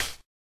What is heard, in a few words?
subtle reverb hi hat sound g sharp key